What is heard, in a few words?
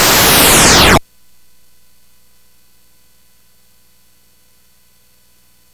CSG8580R5, GAMES; Spikes Peak